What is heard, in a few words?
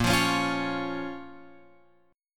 A sharp Minor, down, Guitar, Open F; strummed; JGuitar